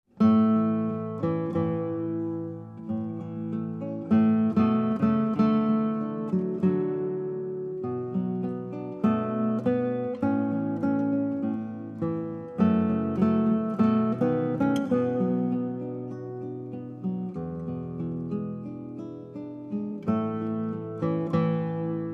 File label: Acoustic Praise Instrumental